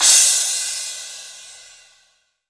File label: trash, Cymbals